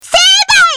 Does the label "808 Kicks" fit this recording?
No